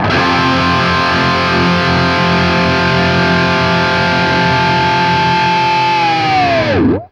POWER F, guitar power chords; East West; Steve Stevens Guitar Samples Collection

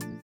VIBRA; Spectrasonics; Metamorphosis

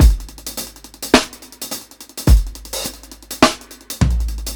105 TRIPLET HATS, DRUMS LOOPS; BASEMENT DRUMS VOL 2

